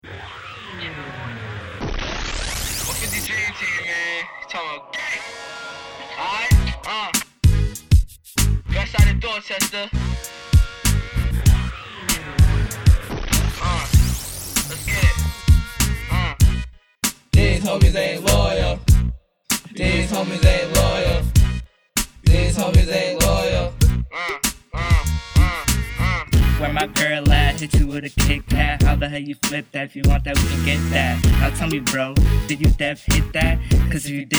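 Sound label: group hip hop with vocals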